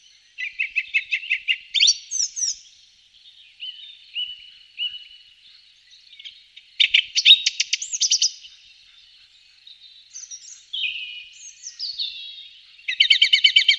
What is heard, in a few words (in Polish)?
drozd śpiewak - inna pieśń; wroblowe; turdidae; turdus